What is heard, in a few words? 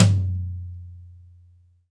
TOM, DRY+AMB TOM